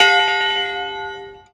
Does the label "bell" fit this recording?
Yes